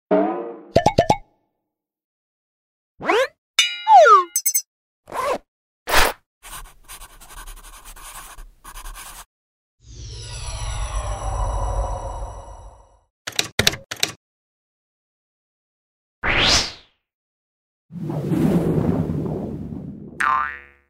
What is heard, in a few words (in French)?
ANIMATION